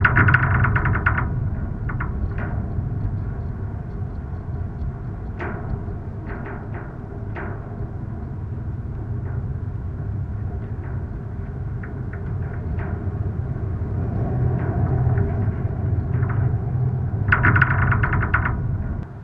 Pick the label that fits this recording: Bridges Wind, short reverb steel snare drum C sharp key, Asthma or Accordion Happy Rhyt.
Bridges Wind